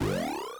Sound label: MOVE; C64; Commodore; C64 Dreams; Multi-Sound Default